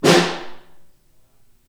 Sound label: SN2 Far, snare; Ableton Foundation FreePacks; Snare Designer